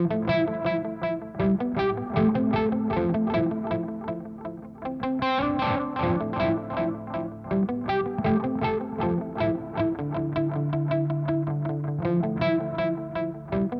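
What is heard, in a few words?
Avalanche Run V2 Gain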